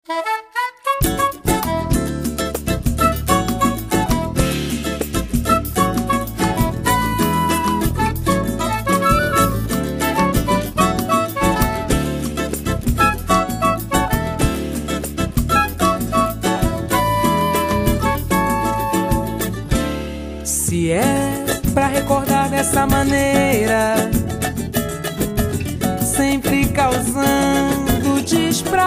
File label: Brazilian Cafe